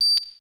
tech oneshot

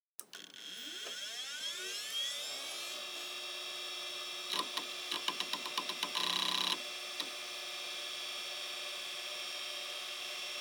tech oneshot

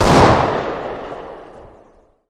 deagle distant old

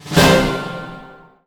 kill

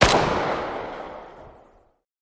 bizon distant

